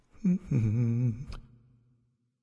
Minion Paint; smite; kumbhakarna

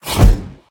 swipe; smite; god cthulhu

